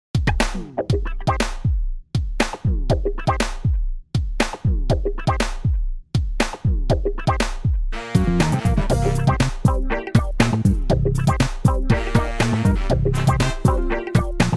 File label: Noire Inst; Private Eye Music